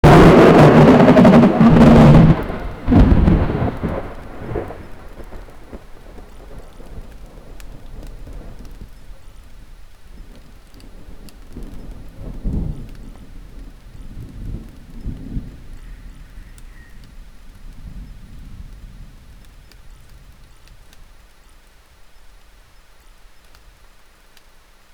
heavy rain in

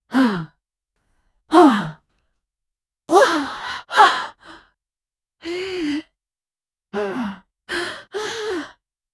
aggressive demonic female

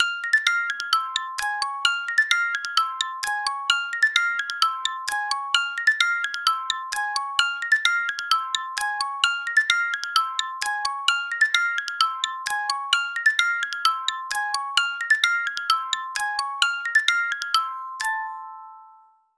chinese frog music box

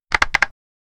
click sound for buttons i; wild west

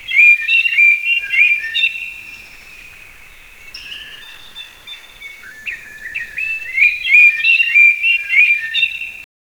a seamless tropical fores

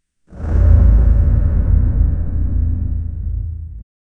massive stone gate openin

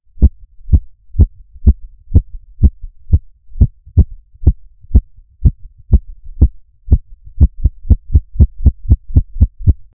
slow deep heartbeat

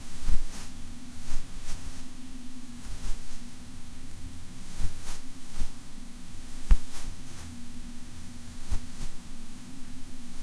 match sound for match 3 c, casual games

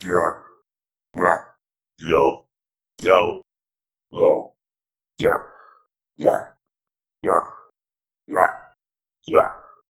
countdown with zombie sounds

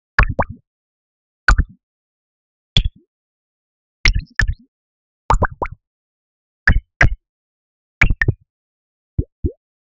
cartoonish object drop

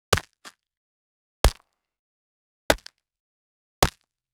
4 beat audio logo with

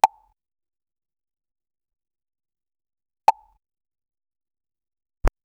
palm muted shamisen koto, japan

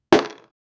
one die falls on a, game

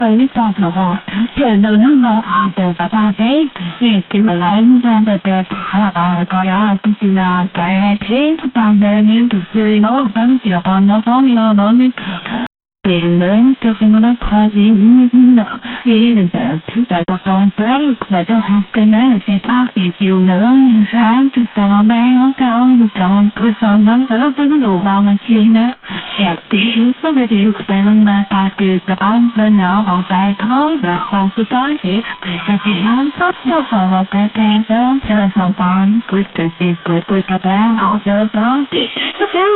radio 1930s film chinese

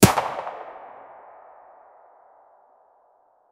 mp5 9mm single gunshot

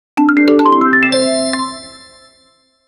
the sound of the beginnin, competition

